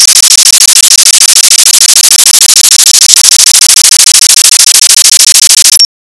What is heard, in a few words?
explosion